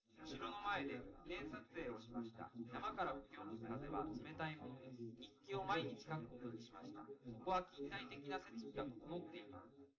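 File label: male, live recording 250ms 1m; SiSEC11; underdetermined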